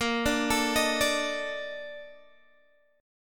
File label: Bb Major 11th, Guitar, DADGAD